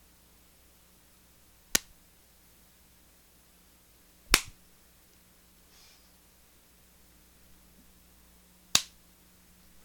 Slap

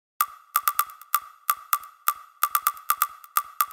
Percussion Loop 128 Bpm

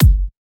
Kick Soft Bright

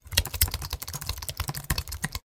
Computer Keyboard Typing

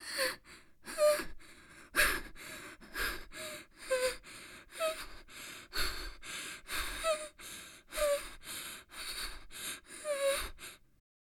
Heavy Breathing

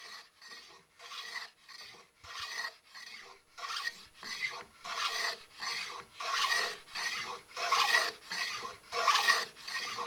Carpentry Planing Timber By Hand

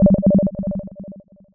Synth ping

